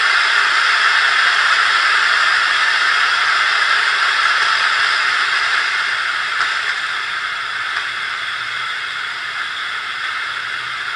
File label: Bearing Being Lubricated